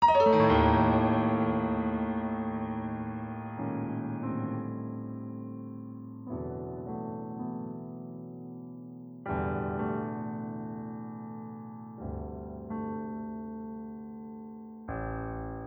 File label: Solo Piano Improvisations